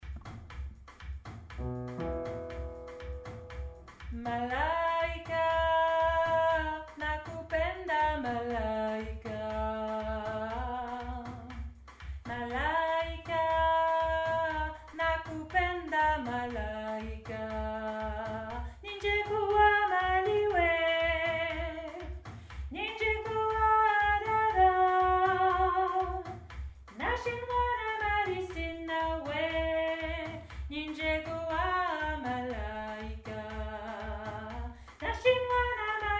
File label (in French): Hommes